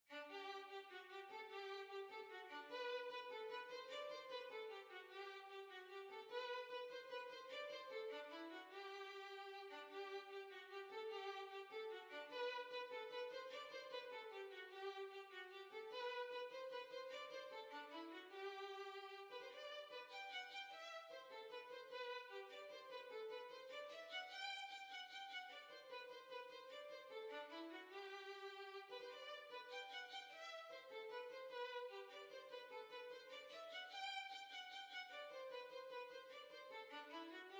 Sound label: Violin